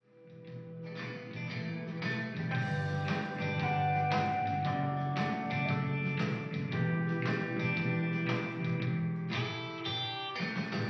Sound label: Rock; Usen; Fist of the North Star Lost Paradise; Hokuto ga Gotoku; PlayStation 4 gamerip